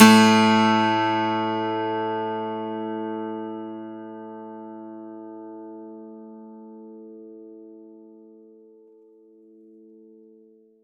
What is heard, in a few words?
PLK 12STR A2, Acoustic and Electric, Plucked; Polyend; Simulacrum Sounds; 1 Shots